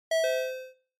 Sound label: Quiz Ding Dong Fast Single; クイズ ピンポン; 速 シングル